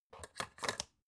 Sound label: folder clamp flip